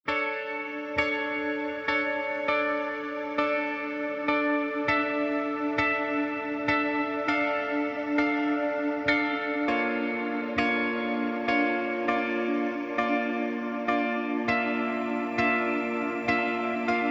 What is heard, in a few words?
Dark Fountain